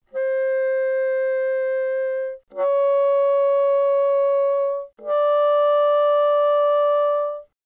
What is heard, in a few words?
Bassoon mf C5 D5, woodwinds